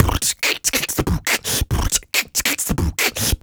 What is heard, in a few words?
Human Beat Box 140 Pop; Loopmasters